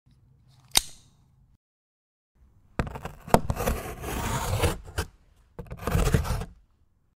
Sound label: Cut cardboard